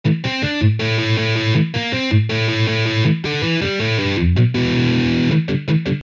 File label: transpose riff